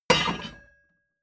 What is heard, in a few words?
hammer hit metal